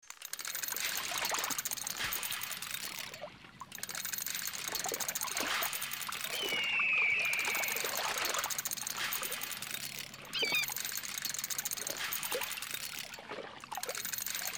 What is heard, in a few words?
Amb